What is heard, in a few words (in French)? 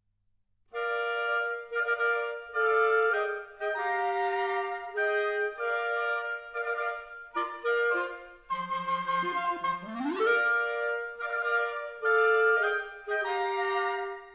Sonate pour hautbois & piano; III. Final. Assez allant